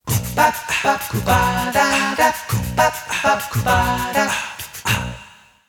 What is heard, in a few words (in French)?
Emission link, jingle